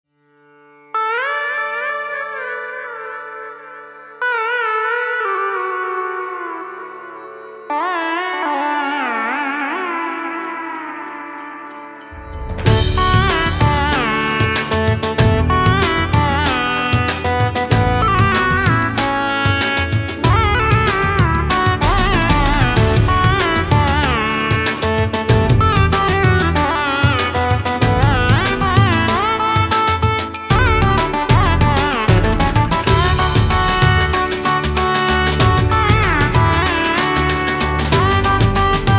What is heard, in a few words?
Jingle Bells Indian Classical Version